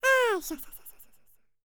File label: HIMALAYAN, World Voices; ILIO; Vocal Planet VOL-5